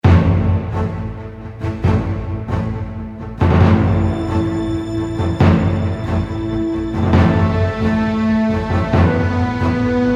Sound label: Short Cinematic Trailer